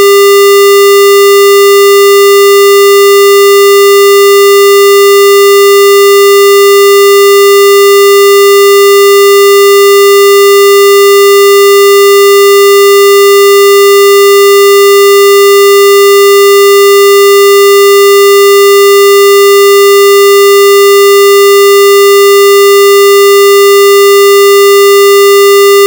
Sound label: tone square; portapack-mayhem